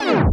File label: lose; geometricaldash